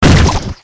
Attack

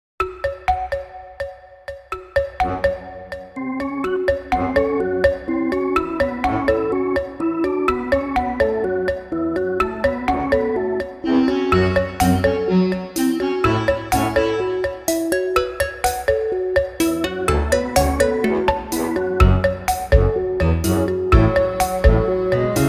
lawn bgm

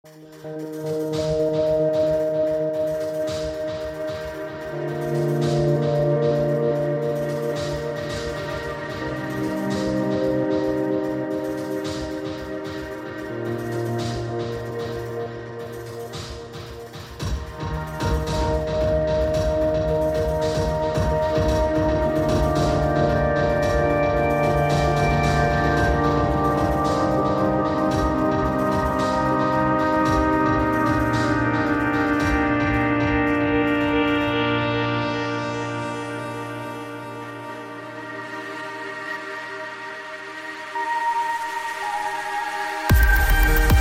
bgm

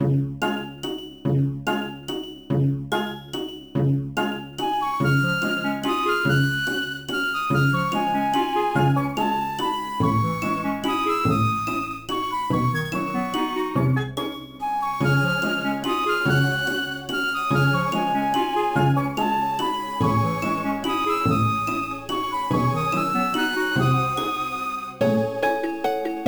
Underwater; TowerOfGreenSlime

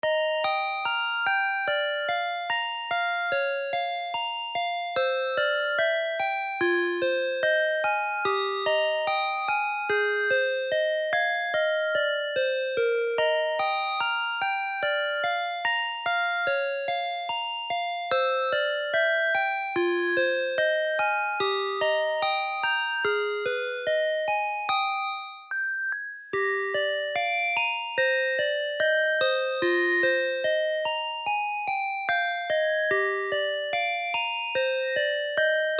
BGM Stage Clear

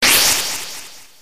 wind

